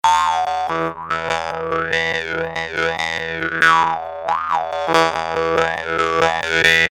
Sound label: Khomus, Asian Ethnic Sounds